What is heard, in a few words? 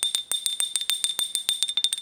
bell